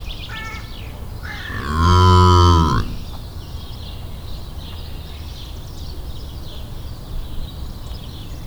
szelmentes, kardszarvu antilop; debreceni zoo 2012; marantz puska

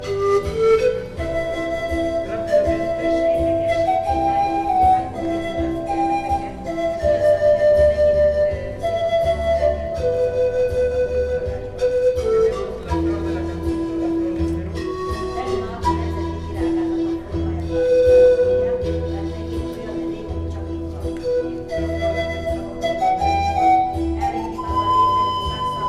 utcazenész

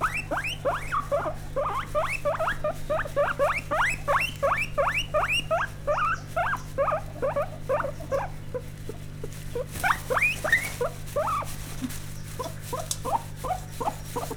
tengerimalac; szegedi zoo 2011

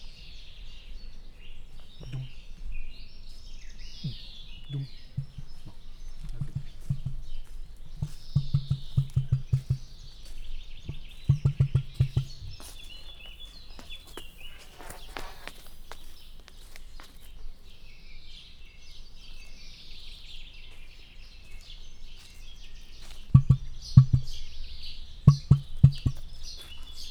szóló, emu; jászberényi zoo 2015

